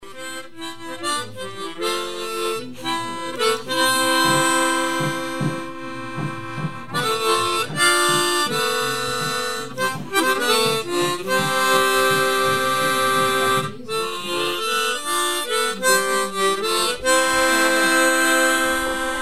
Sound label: mouth organ slow